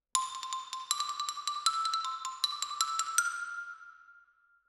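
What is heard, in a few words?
Xylophone Close, Jazz for piano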